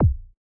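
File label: Bass Drum Analog; Yamaha DJX-2B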